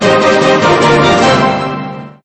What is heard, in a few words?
total win